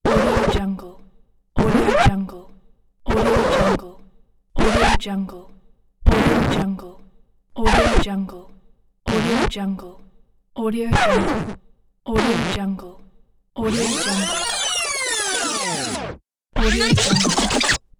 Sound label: a record scratch